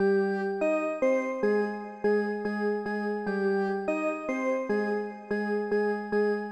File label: Layer Synth